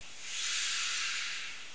direct air, Class 47; OpenBVE; Train

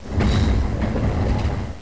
Point, Class 47; OpenBVE; Train

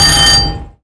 tpws self test, CLASS 47, Train; OpenBVE

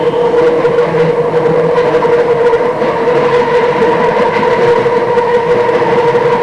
Flange, Train; OpenBVE

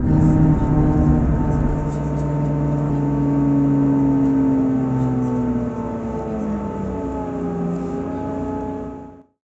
Revving down, Train cl158; OpenBVE

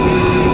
Motor, train; OpenBVE

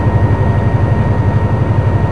Motor, Train; P42DC x2 11 Car Superliner Long Distance

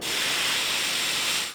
air high, Train, R62 Kawasaki; OpenBVE

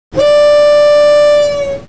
klaxon, Class 47; OpenBVE; Train

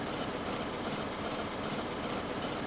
Loop, Train; OpenBVE

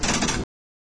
motor, Train; OpenBVE

